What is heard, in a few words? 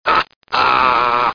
hahah